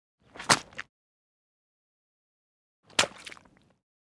body falls into mud; Parkour; Butterfinger Video Sound Effects; Additional Parkour Sounds

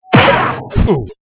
fight

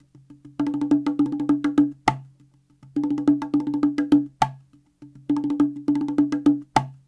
Cahon

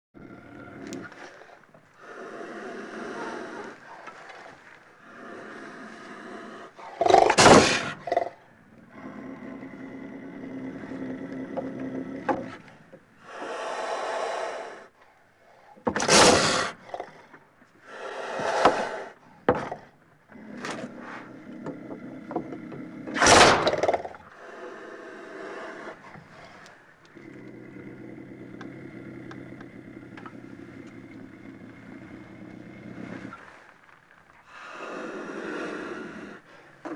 wildcat hiss

